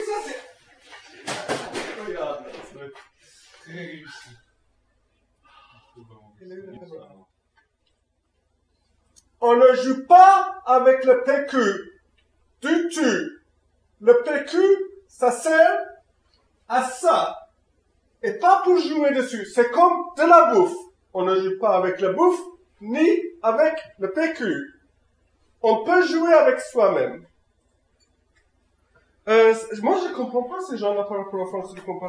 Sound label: Toilet paper fight